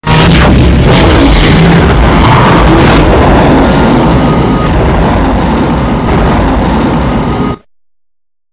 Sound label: activate